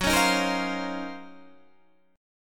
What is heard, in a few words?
Eb Minor 13th Gb